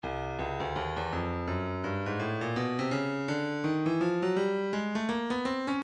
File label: breakbeat midi files 080 084 bpm; oppressor d; Stylus Breakbeat Midi Files